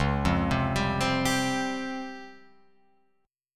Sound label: C sharp Major 7th Flat 5th C sharp down Guitar Open F; C#M7b5 arpeggiated; JGuitar